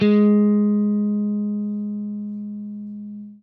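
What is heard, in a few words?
jazz line, guitar; Quantum Leap - Guitar & Bass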